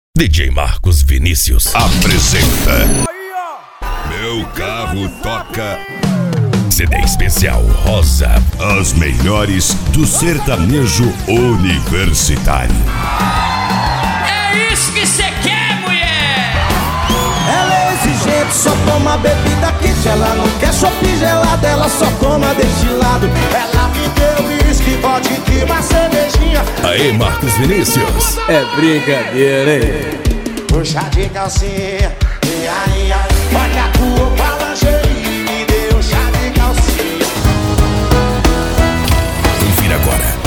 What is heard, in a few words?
sertanejo